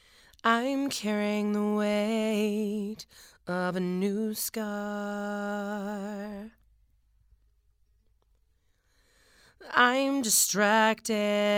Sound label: Female Vocals